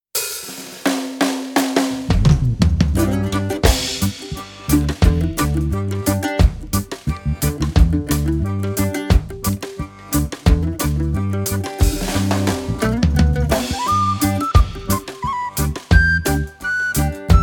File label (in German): menuett